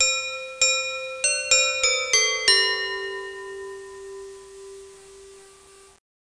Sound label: chime; Santa's Workshop - Advent Calendar for Computers